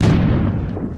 cannon fire; Minecraft; Votive 1.21.1; Votive Content

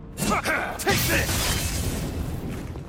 attack; minecraft; Votive Content

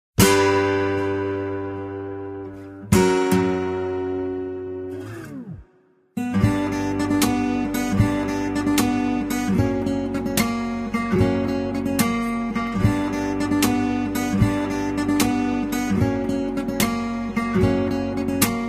guitar enemy; Minecraft; Votive 1.21.1; Votive Content

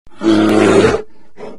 bear attack; animalsvotive; Minecraft; Votive Content

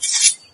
hidden blade open; Minecraft; Votive 1.21.1; Votive Content

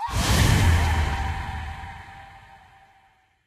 magic attack; Minecraft; Votive 1.21.1; Votive Content

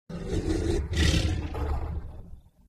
bear sleep; animalsvotive; Minecraft; Votive Content

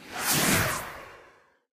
magic starting; minecraft; Votive Content

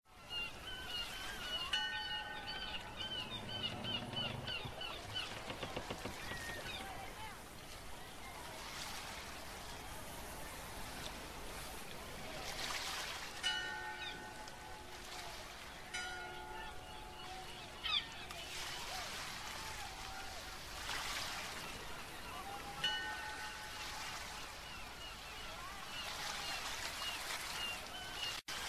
harbor; Minecraft; Votive 1.21.1; Votive Content

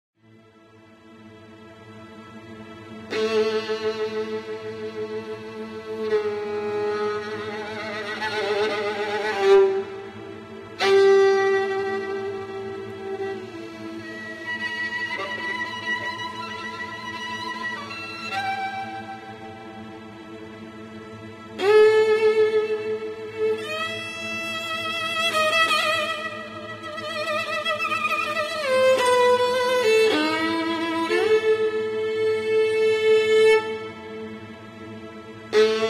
mysterious suspense violin; skripka; Minecraft; Votive Content

